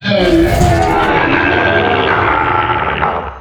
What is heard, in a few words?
Death